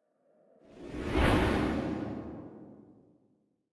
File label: container Fade In; Keepsake; puzzles